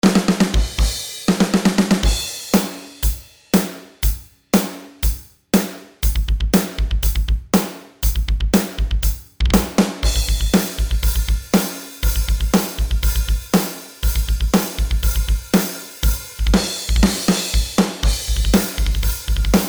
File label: drums metal standard; gorangrooves; handy drums